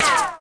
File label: Ricochet